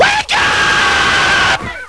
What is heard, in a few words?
wakeup